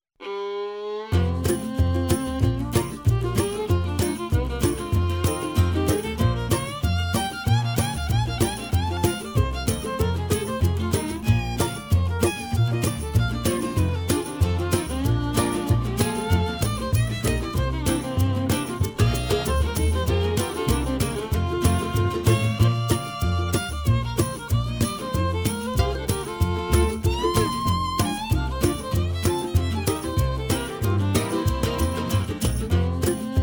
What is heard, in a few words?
Bluegrass Mandoline; Northwest